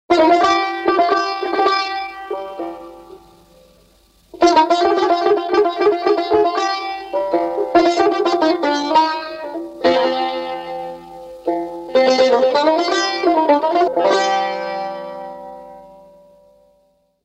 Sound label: Saz o Avaze Shour